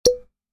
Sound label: Glass bottle finger pop